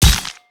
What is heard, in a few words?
hit